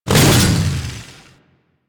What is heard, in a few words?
Saw Melee Swing; Grn Ghoul Saw Player